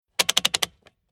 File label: Industrial Safty Lid Lock Clicks Diesel Truck Tank Tightening Lid Fast